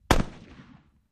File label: .50 Caliber: Single Shots